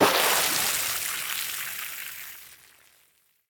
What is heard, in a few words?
liquid; teardown ps5 windows xbox series xs gamerip 2022